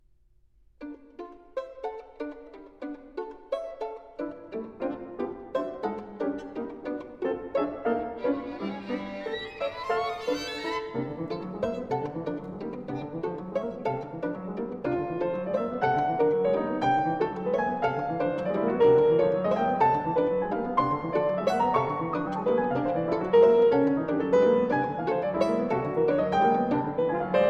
trio for violin horn and piano vivacissimo molto ritmico